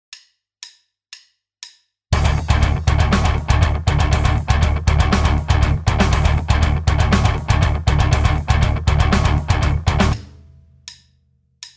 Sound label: rhythm